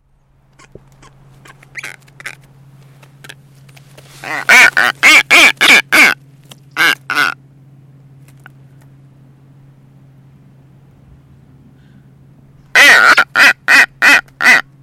Blue footed booby; پرنده بوبی پاآبی

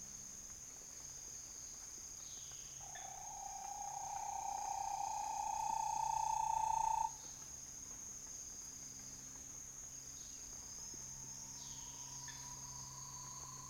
Bahia-tapaculo, پرنده تپلک باهیا; صدای پرنده تپلک باهیا

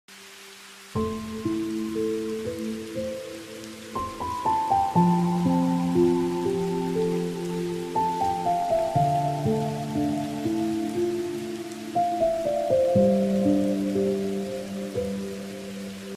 Rain and piano

